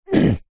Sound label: human man clear throat